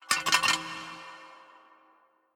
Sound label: lamp Shake; netent; wishmasteroct